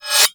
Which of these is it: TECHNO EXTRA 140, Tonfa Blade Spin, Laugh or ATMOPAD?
Tonfa Blade Spin